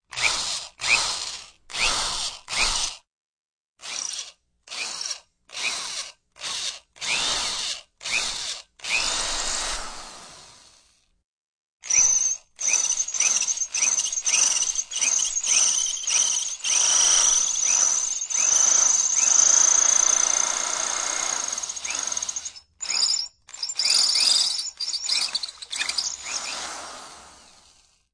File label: Beeps; 헐리웃특수효과음; 신호-경적